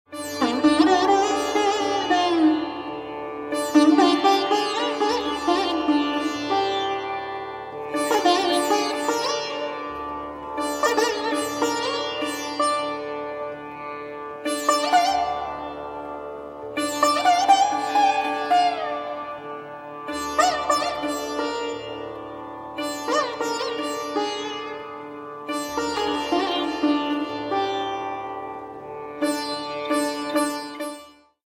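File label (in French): sitar, cordes pincées; le sitar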